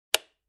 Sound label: sound off